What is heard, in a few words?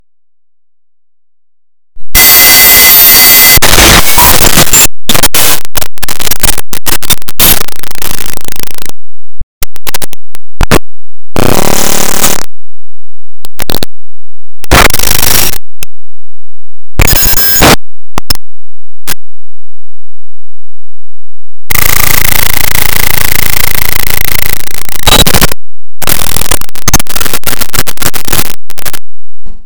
noise